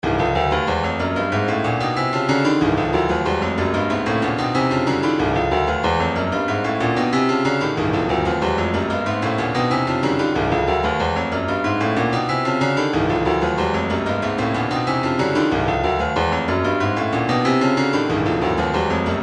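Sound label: retro funk 093 percussion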